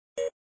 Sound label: Menusound System Select, flipper; sttng